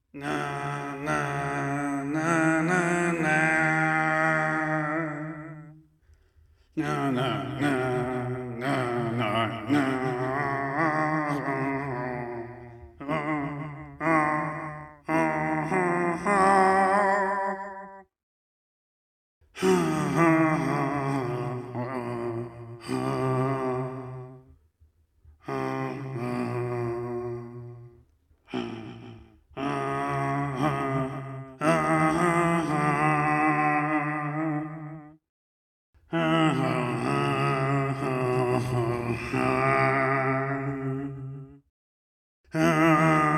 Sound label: Main Menu Sing; Zote